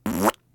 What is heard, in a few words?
Raspberry